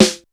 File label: HOLZSNARE, snare drum; SNAREDRUMS